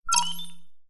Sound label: science fiction door code unlock accept